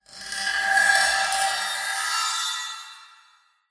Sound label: wood rattle; Half Life Alyx; Half Life Model Pack